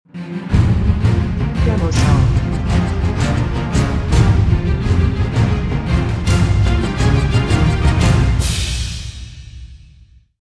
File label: Epic Intense Power, Intro or Happy Ending